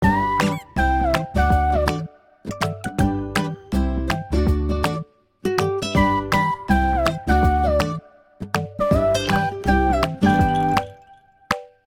Jam Jam, ringtones Fun; Samsung Galaxy S24

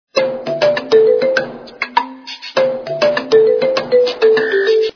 Ringtone; Okapia

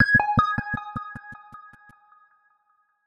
Signal, notifications; Motorola; Edge 70 Max China

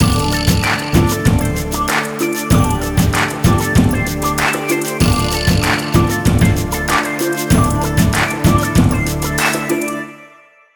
Hop, ringtones; Wiko; Cing Peax

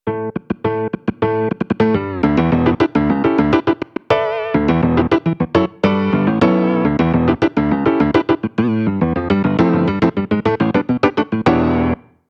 Funky, ringtones; OnePlus

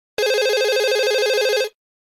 Electronic; Nokia 5300 XpressMusic; Ringtones

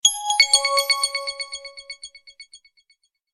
Glassy, notifications; ICE; Advant Edge 3G

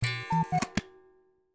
Cuckoo Clock, notifications; Samsung Galaxy S25 Ultra; Retro

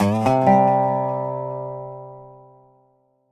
Country Guitar, notifications; Lenovo; ZUI 13

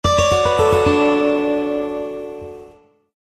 waterfall, notifications; Sony Xperia C3

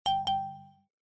charge; Oppo Real-R807

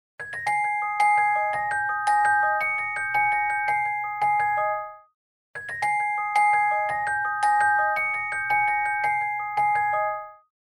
Music box, ringtone; Tinno Cricket Ovation

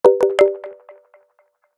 Mutter, notifications; Power Rage

